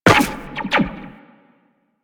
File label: Run Zipline, Footsteps; DE; Warframe; Tenno